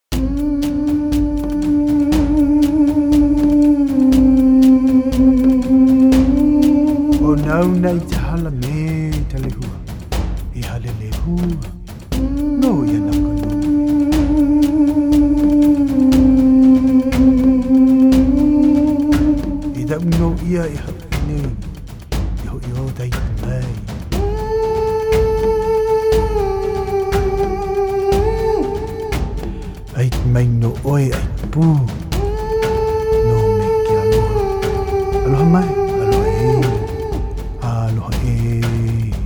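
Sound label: Chants Percussion 120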